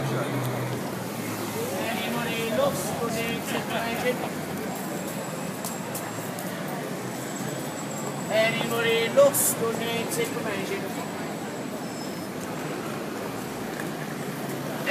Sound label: london street call